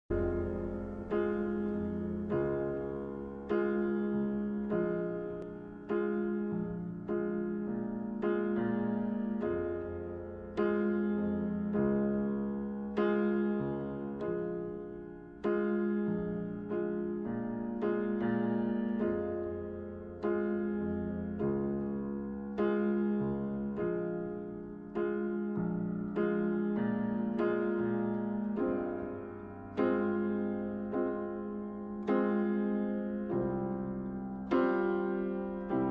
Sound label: ultra slowed reverb